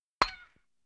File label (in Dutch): Tennisbal Raakt De Grond; KMP Film Video Sound Effects